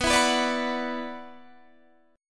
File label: F sharp 7th Suspended 4th Sharp 5th B